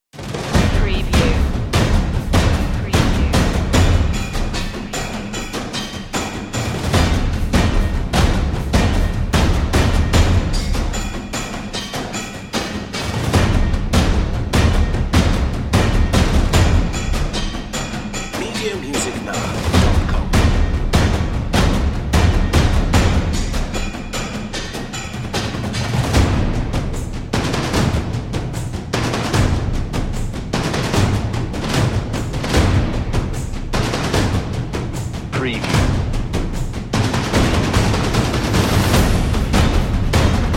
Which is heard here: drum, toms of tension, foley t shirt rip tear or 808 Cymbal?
toms of tension